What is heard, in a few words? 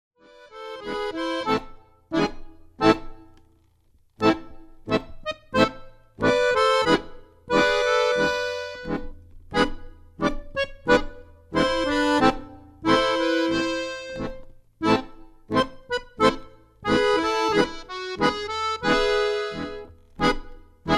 Tango